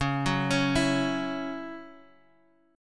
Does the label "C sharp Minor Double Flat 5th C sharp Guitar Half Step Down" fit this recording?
Yes